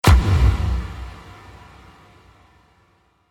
Trailer Hit Resonating Strike

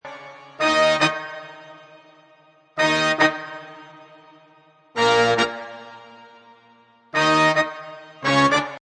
Horns Full Riff A, Horn riff in A# at 110bpm.; Brass Loops

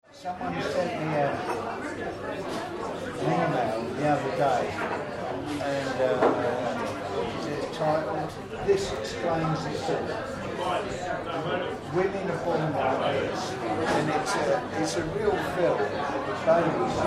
Pub Crowd Mixed Chatter, Medium sized pub; Bars And Club Sound Effects

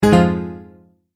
multimedia tone error

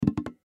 Hand Drum Large, Hand Drum Large Hit Finger Roll; Musical; Drums; Blastwave

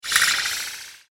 Fast Laser Discharge

